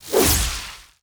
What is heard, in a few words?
BOSS Atk; Fabao